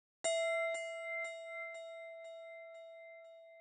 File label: bell